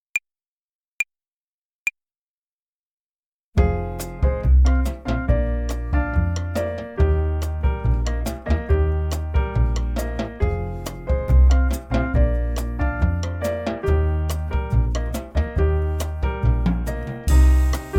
Play along, Altsaxophon; Jazz Club Altsaxophon

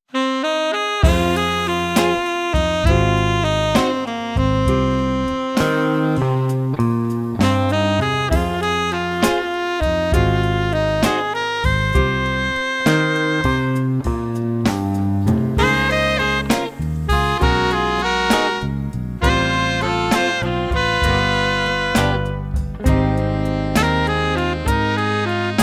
Jazz Club Schlagzeug

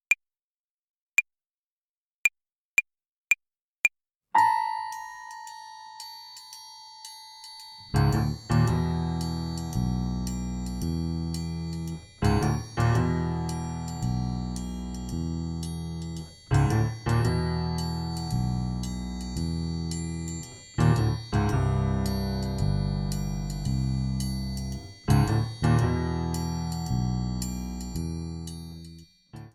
Play-along, Tenorsaxophon; Jazz Club Tenorsaxophon

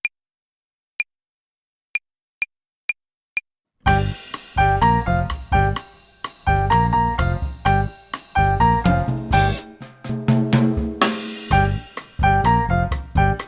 Play along, Jazz Club Tenorsaxophon

